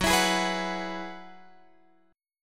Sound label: Gb 9th Suspended 4th Gb down Guitar Open F, chord; Gb9sus4 strummed